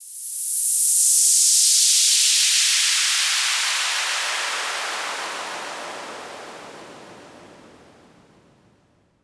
Riser Noise Downlift

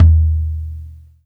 Tom Low

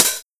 HiHat Foot TR909

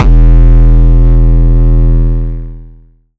Kick Dist 808

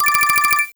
Phone Beeps

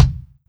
Kick

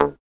Perc Jaws Harp Short; Ichbindaja; pitchglitch

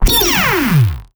Laser shot

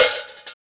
Perc Metallic Loose

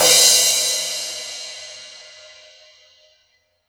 Crash Hit Long; BJAPacific; pitchglitch

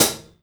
HiHat Pedal; Millo V1

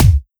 Kick; Soneproject; pitchglitch samples

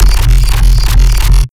Sound FX Bass

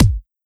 Kick

